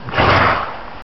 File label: snort, horse; Fate The Cursed King; MONSTERS